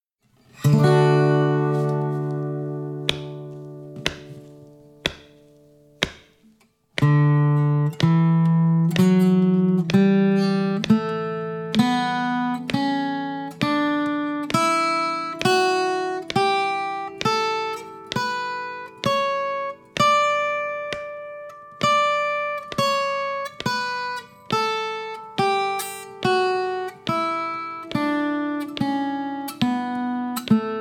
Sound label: D Scale